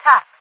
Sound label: tap, radio voice; Source Engine; video game; npc; overwatch